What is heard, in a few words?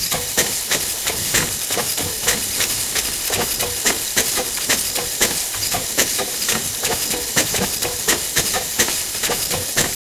steam engine